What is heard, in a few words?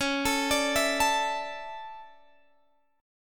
D Major 7th Suspended 2nd